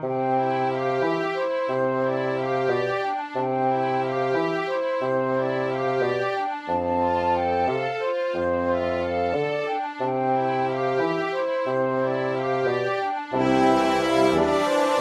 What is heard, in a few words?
static bright bassoon